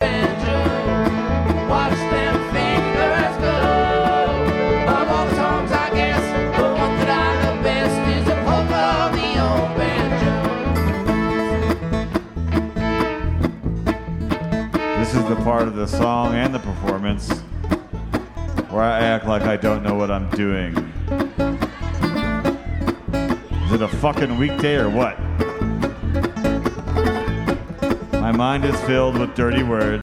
Polka On A Banjo

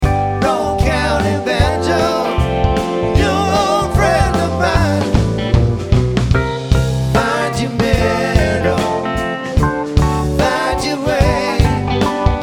Banjo

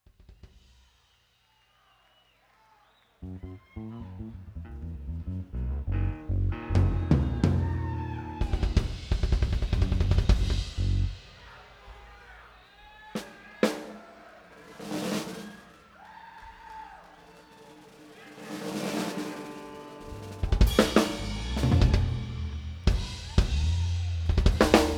Tuning

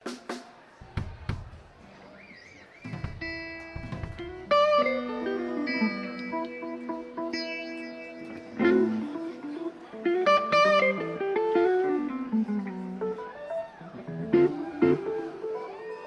tuning

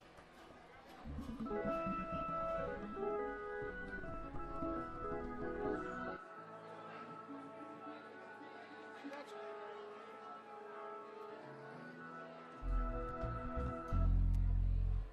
tuning

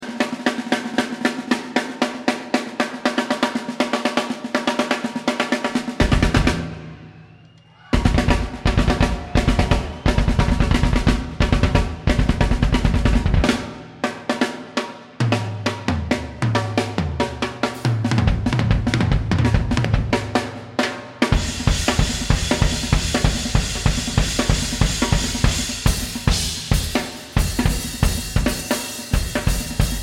Drums

